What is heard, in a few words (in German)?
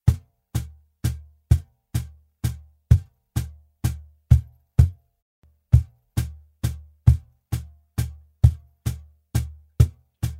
Rap Playback